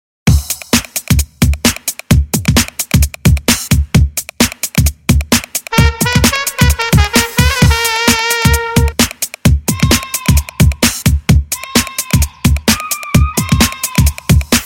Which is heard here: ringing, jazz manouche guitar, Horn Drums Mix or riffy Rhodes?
Horn Drums Mix